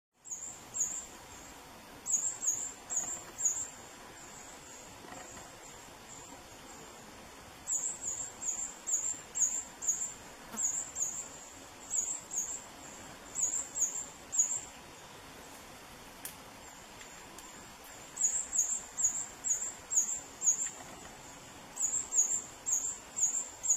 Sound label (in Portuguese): Canto do beija flor roxo